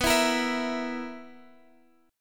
B Major 7th Suspended 4th, Guitar Half Step Down chord